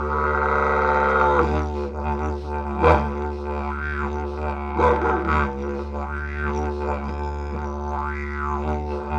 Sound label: Digiridoo